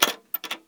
door unlock

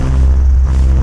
wings

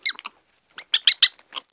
squirrel

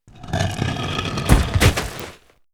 grave test

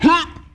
orc hurt

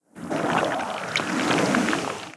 waterfist cast

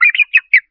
bird

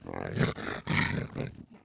attack

